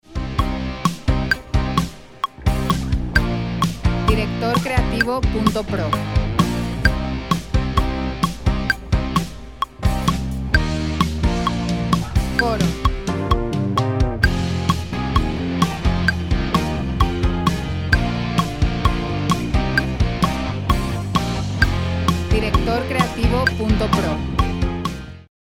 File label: 95BPM 130BPM 4 4 Cm Eb